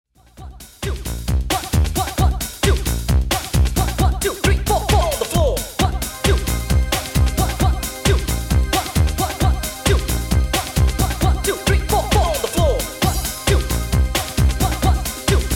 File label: bounce lock